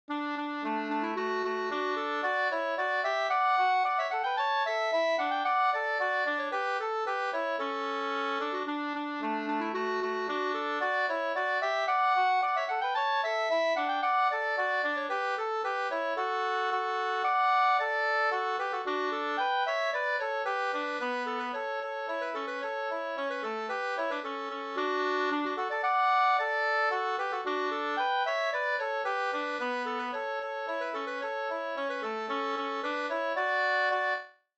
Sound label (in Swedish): polka, 2st